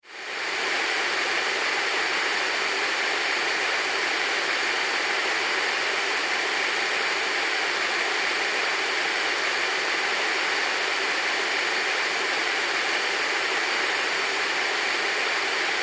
Science Fiction HUD Static Monitor; Communications

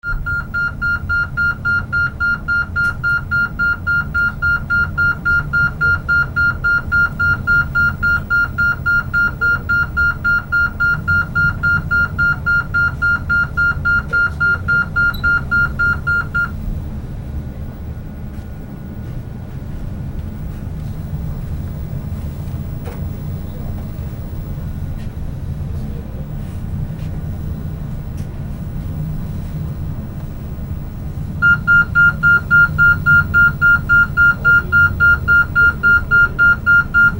Ship Large Ferry Bridge Atmosphere; Tom Hutchings

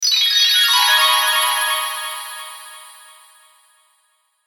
Pixie Dust Twinkle Shimmer; Pixie Dust Twinkle Shimmer Chimes High; Magic

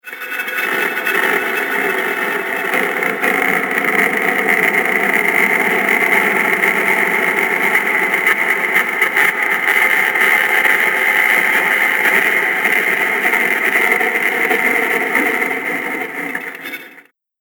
Commotion In The Cavern, scary soundscape; fantasy

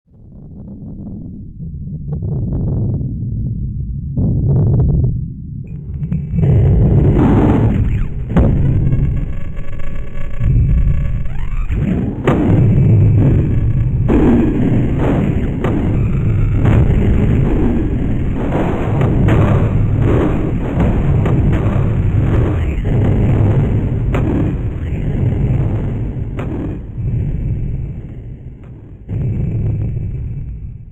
Battle Atmosphere Soundscape; science fiction sound effect; Battle Sound Effects

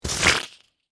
Suction Mud, Texture, Suction, Mud; Water Sound Effects; Water (Sound Effects:Nature); BlastWave

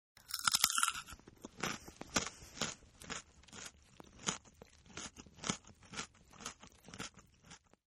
Crunch n Chew, Eating; Human; Partners In Rhyme

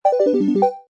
Slot Machine Musical Ditty

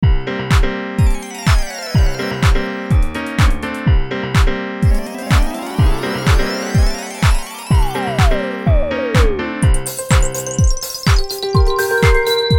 Beat Poppy Piano; Miscellaneous Loops

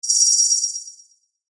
Beep Tone Signal Multimedia; Web Interactive; Beeps (Sound Effects:Multimedia); Blastwave; Technology

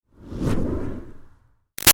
Fire Torch Whoosh Slow Lite; Flames; Objects

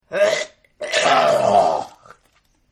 Vomit; Vomiting; Human; Partners In Rhyme

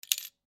Coin Dispenser; Coin Dispenser Insert Penny; Money Sound Effects; Foley; Sound Effects:Objects; SoundEffects-Blastwave

